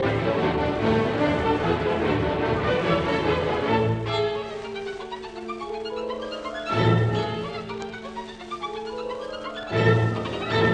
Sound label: Violin Concerto in D major, Finale Allegro assai vivace; Korngold; Op 35; Jascha Heifetz